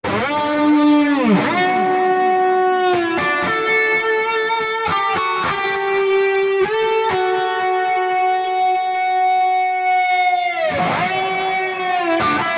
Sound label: Mesa Boogie Epic Lead; Neural DSP